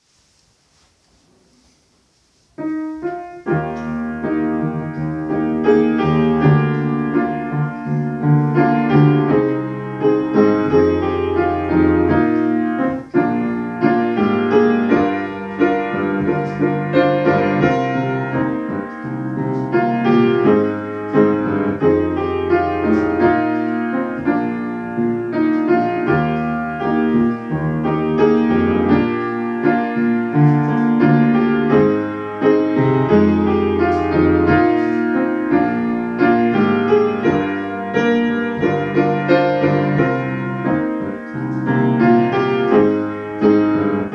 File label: instrumental; engelschor lieder